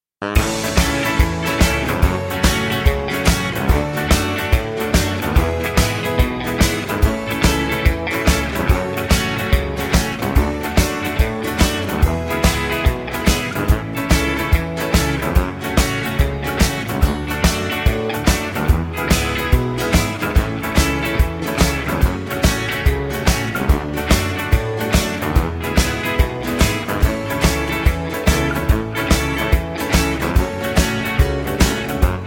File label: A No Backing Vocals, backing tracks